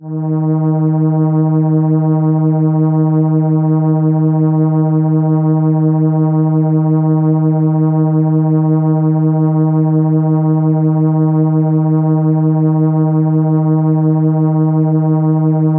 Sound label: Cello D#4, ARP Solina